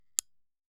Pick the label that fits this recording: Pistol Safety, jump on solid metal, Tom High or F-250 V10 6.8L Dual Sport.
Pistol Safety